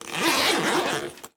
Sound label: Metal Zipper, Clothing; 1001 Sound Effects